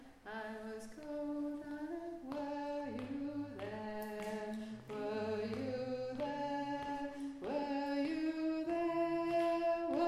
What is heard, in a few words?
were you there Low Voice